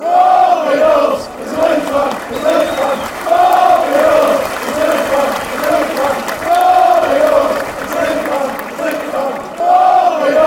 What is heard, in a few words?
fan, fan chants; rob hulse; leeds